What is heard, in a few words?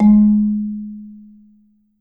G#2, Marimba